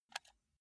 console controller arrow key press

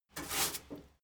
mechanic sink short brush

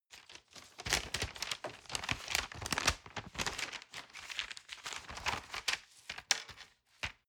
fold key into paper

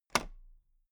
toolbox latch open loose

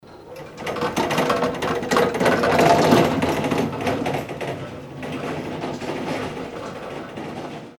forklift truck passing

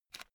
headphone extend single short

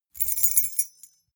key ring small rattle air hard

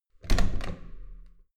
metal door heavy handle use hard

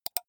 computer mouse left click single fast

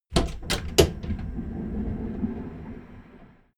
train intercity train door open stereo

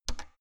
oven button press squeaky

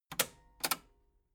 metal button cassette stop slow

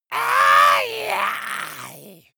monster scream high

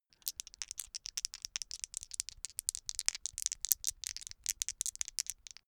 dice shake hands open long

